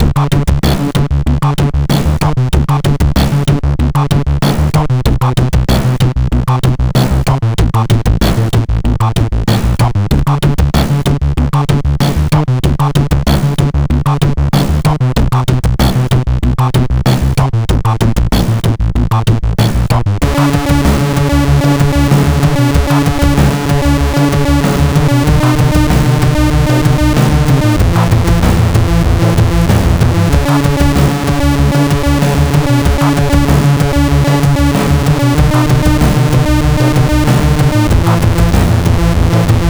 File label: trance